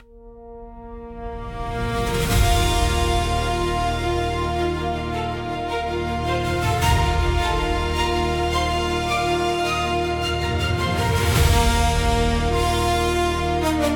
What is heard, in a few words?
fanfare; starwars